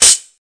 HHC, Volca; drums; hip hop; WallsVado Volca Sample Pack

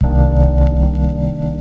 fat fuzzy piano hit

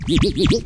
vocal scratch, Scratching and Turntablism; DRUM LOOPS and BREAKS

